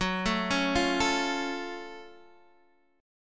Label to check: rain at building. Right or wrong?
Wrong